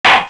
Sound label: gavel; judge juniper; custom objections